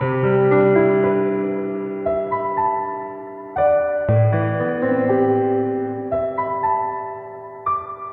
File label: piano, sad; loops